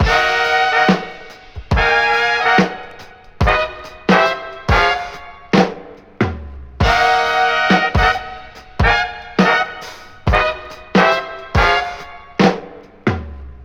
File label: horns, Phonky loops